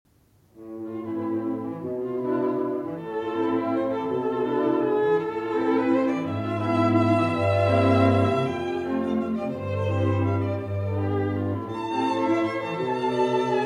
String Quartet No. 15 in A Minor, Allegro Appassionato - Presto